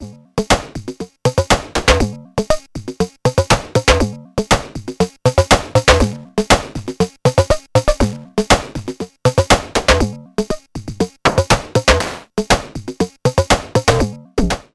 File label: Transient Plus Compander; Future Retro